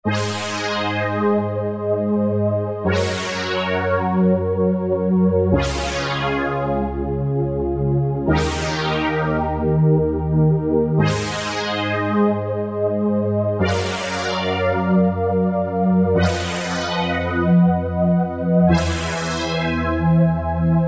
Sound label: Ana. Pad; XG Voice examples